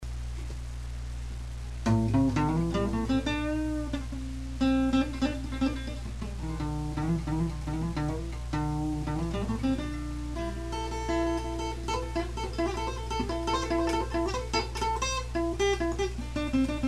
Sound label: blues jam; just messing around with the guitar